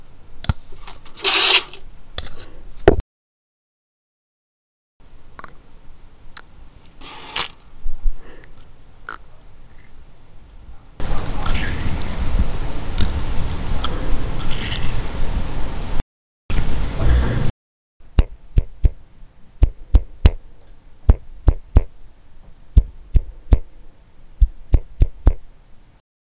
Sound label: Syringe